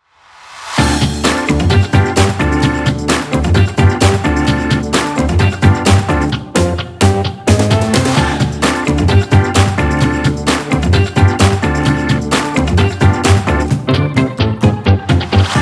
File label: Fun Quirky Retro Funky Short no melody, BPM130